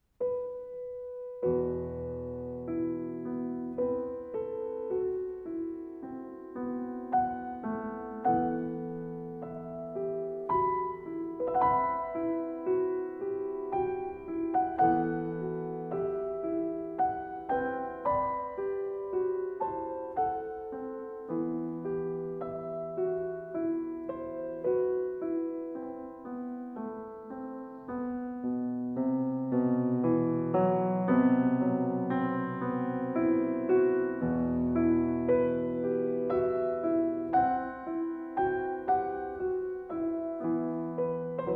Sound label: Lento